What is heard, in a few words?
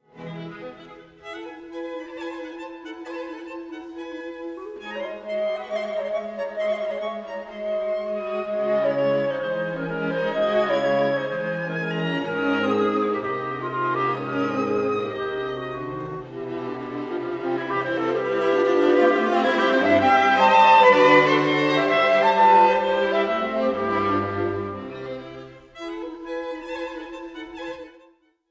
quintet in e flat major op 102 for clarinet and strings allegro scherzando; robert fuchs